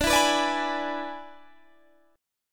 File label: E 7th D, guitar